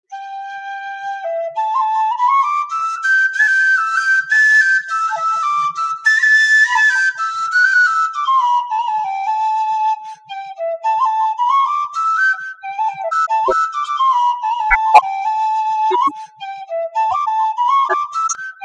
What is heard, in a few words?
Whistle